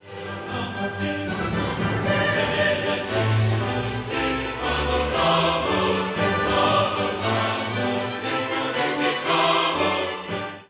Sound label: Gloria Laudamus te